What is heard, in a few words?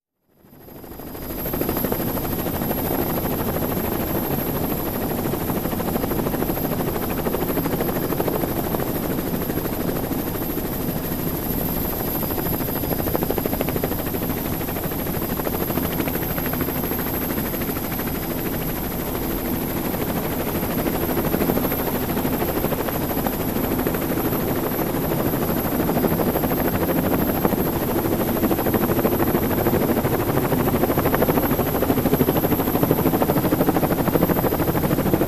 Helicopter engine shutdown on ground